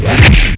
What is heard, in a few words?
Yeti Thump; Playable Tutorial Level; TR2